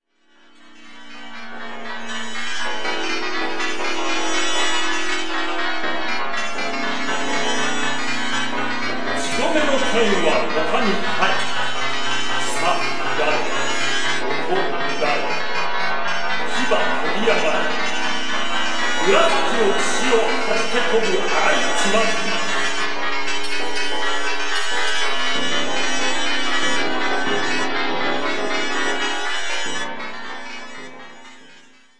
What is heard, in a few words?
Legend BGM